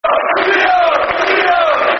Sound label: Theo, Theo, Theo, Theo, Theo... Football Chant (Soccer Song)